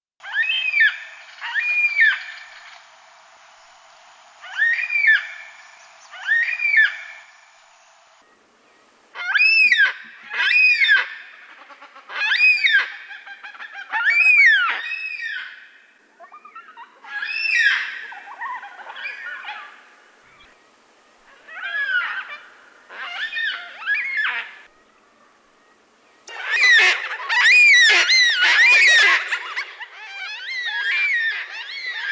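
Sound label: yellow Tailed Black Cockatoo, cockatoos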